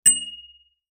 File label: bell